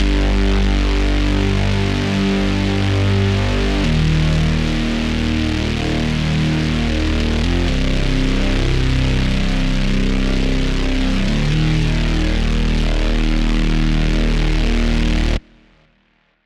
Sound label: Dark Distortion